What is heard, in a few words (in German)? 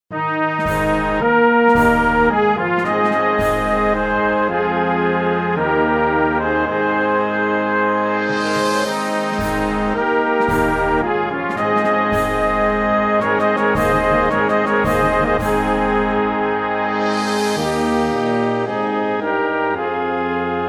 Festfanfare